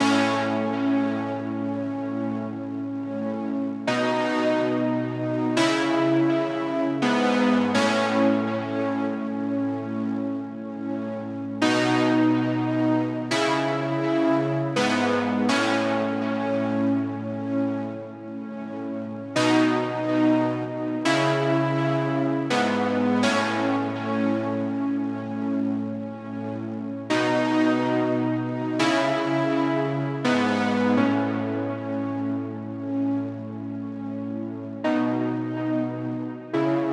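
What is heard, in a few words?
124 BPM C# Minor Synth Chords, Euphoria; Cymatics; GHOST (Beta Pack); Come Back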